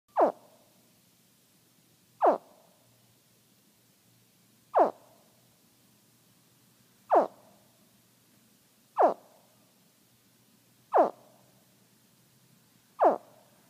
Baby Alligator Calling For Mom

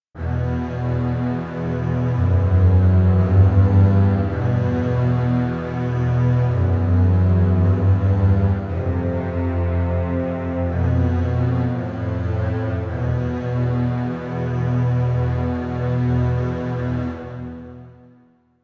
Bass And Cello

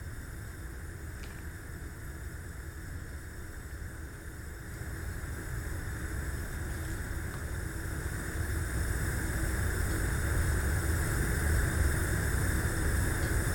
Gasleak From The Stove

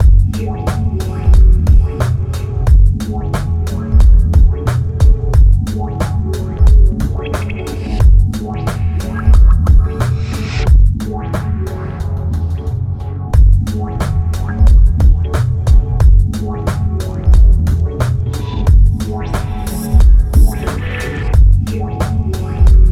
Underground Beats Drums Piano Synths 90 Bpm

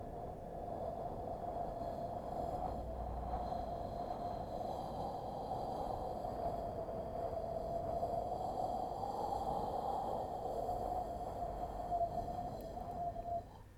Wind

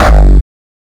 Kick

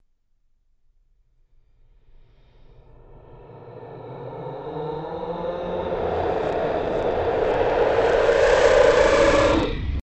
raw screams

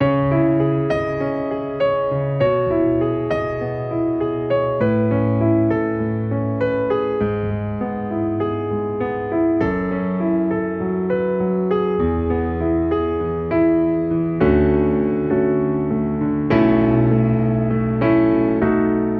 Emotional Piano A 100

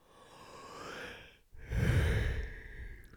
Another yawn

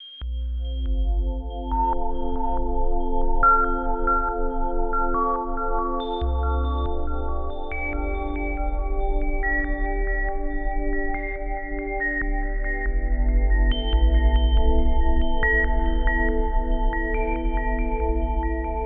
Lunar Experience Pad

